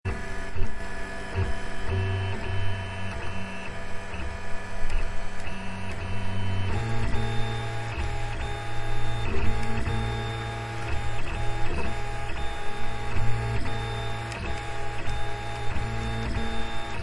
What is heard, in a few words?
3d printer